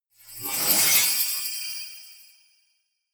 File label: beam